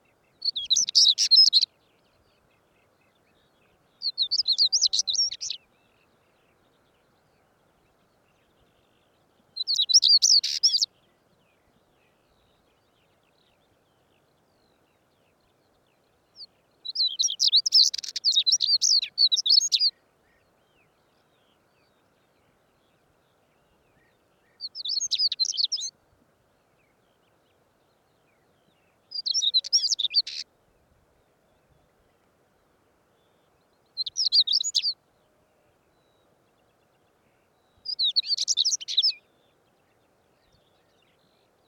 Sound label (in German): sibirisches schwarzkehlchen